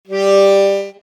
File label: step; accordimoth